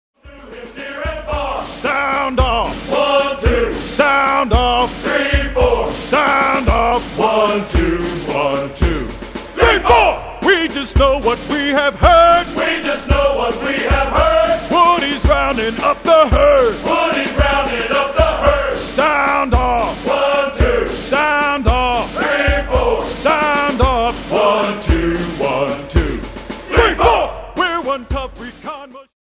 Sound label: sing along, cadence